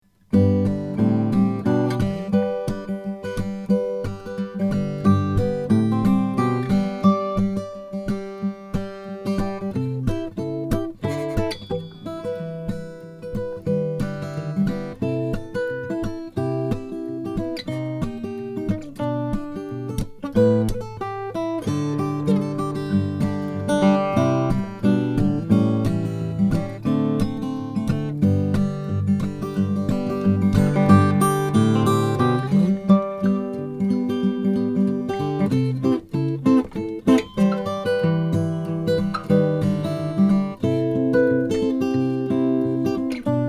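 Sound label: borges om 28 brazilian rosewood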